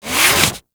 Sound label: Tenno Arsenal Working To Sleep, machines; Liset Machines